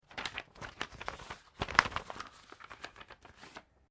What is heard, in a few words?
PAPER SHEET MOVEMENT DOUBLE FOLD; Material components for constructing more complex sounds